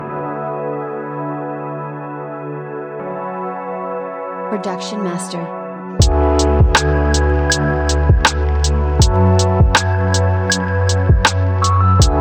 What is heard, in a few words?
Lofi Trap; Production Master; Memories